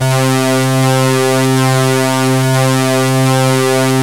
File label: ODY, ARP Odyssey